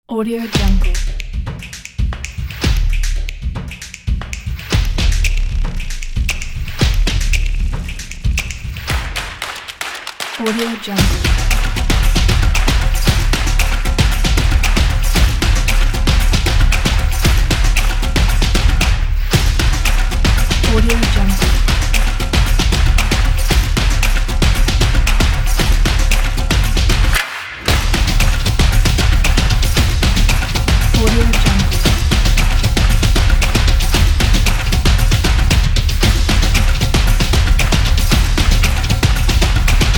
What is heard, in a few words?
desert